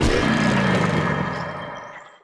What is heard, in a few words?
jetski; giants